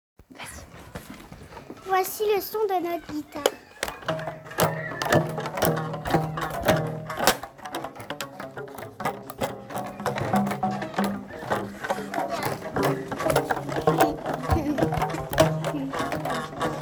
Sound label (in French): Guitare